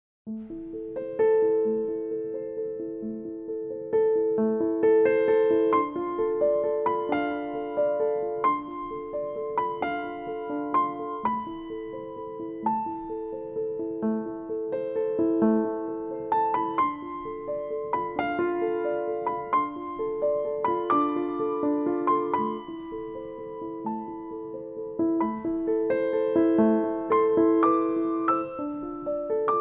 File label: Solo Piano